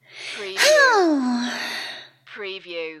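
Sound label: Female Expressive Sigh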